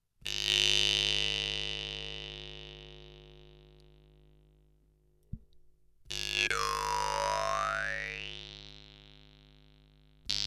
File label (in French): guimbardes; fantome bille g2